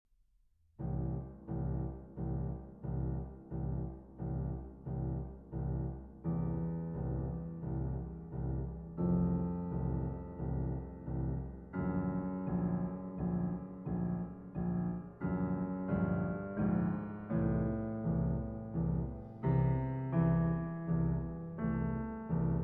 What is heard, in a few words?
Piano Sonata in C Minor, Andante assai